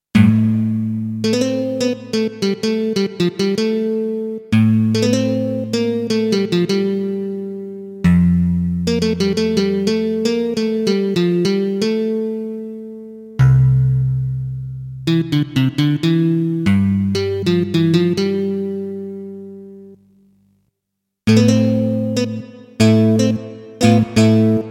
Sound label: Funkit, Kawai K1; Bank 2 card A